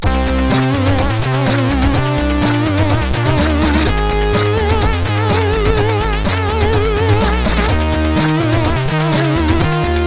Good Blark, CSG8580R5

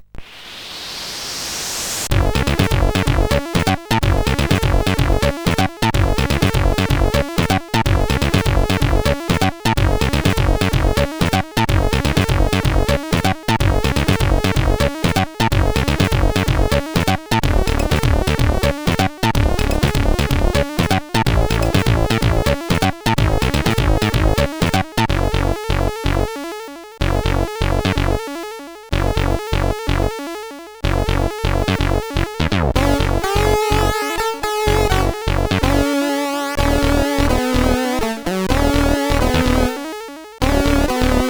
sid, CSG8580; Looper intro edit; hvsc

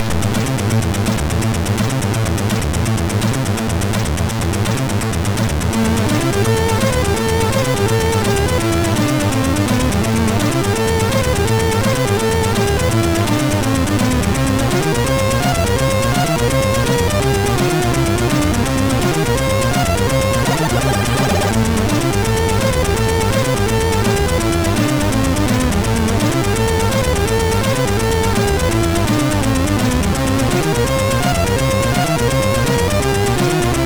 sid MOS6581

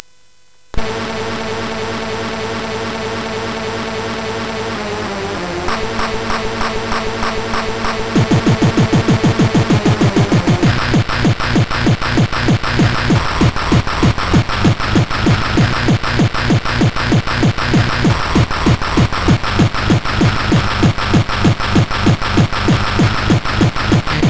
Hardrave, CSG8580R5

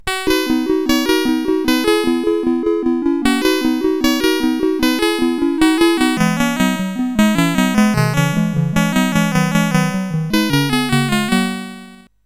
Narrow Squeaks, CSG8580R5; Orm and Cheep

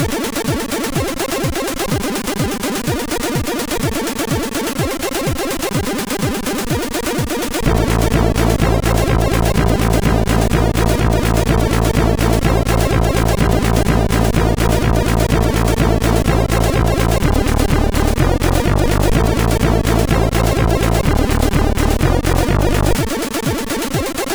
Techtune, sid, CSG8580R5

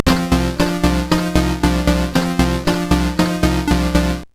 Fire Breath, CSG8580R5

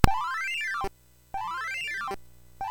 MOS6581R3, GAMES; Slinky

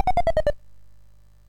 MOS6581R2, GAMES; Sammy Lightfoot

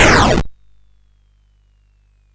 Frantic, CSG8580R5

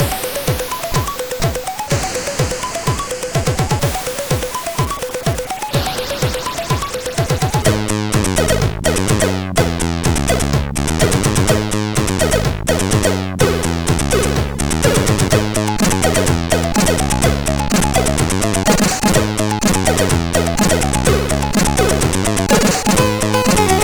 Intro, CSG8580R5; hvsc

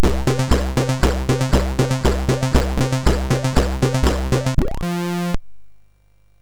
Fire Breath, MOS6581R3

